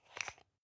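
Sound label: toothpaste open hard, Bathroom; haus-garten; badezimmer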